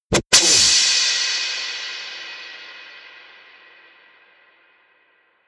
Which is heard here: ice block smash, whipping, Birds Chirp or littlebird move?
whipping